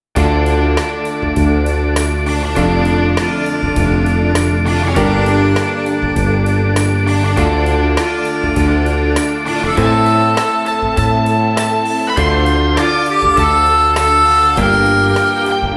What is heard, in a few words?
Easy Rock Ballad 100